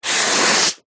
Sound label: office sponge brush single stroke on paper long; zapsplat